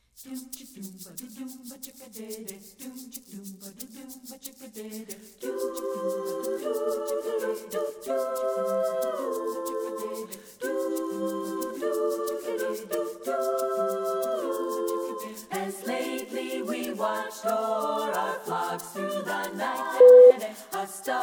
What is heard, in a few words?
a cappella; as lately we watched